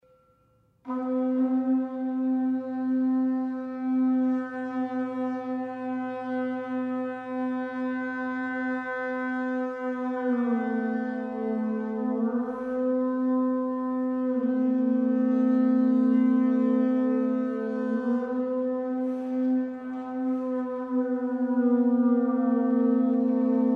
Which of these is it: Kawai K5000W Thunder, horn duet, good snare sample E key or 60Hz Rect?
horn duet